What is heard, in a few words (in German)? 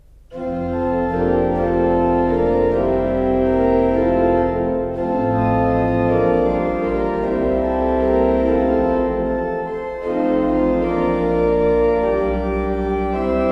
Breil, gebrauchtorgel